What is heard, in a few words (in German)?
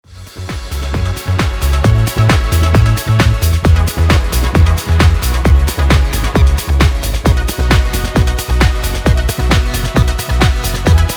Schlager